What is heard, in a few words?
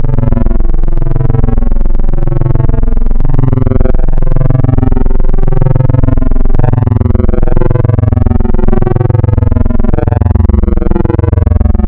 Spectral, wavetables; Architecture 32